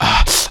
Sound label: vox AH SSS